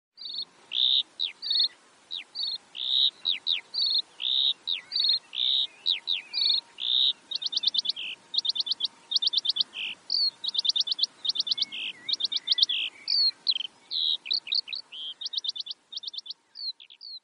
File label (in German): lerche